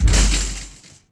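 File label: metal rattle Hit medium; objects; Star Wars Obi-Wan; XBOX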